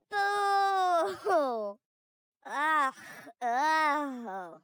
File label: Woman Booing; voice; Zomboid; Lifestyle